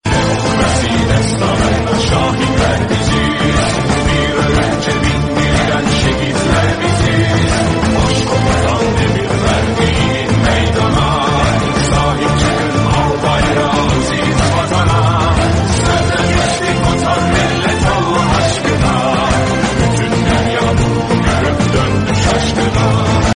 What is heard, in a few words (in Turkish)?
marsi ringtone; 15 temmuz; bollywood